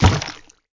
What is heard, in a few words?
powerup shield; mobile